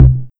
Kick House

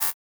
Open hat

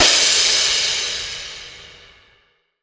Crash

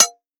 metal

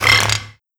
Scifi Drum Rattle; Audiopapkin

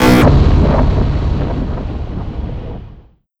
Sound FX Scifi Organic; Audiopapkin

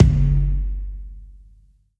Kick Trap

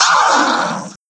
Scratch; pitchglitch samples

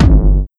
Kick Dist Soft Long; Arnasarnas

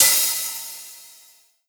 Hi Hat Dubstep Open

